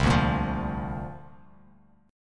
C 7th Suspended 2nd Suspended 4th C down Bass Standard; C7sus2sus4 strummed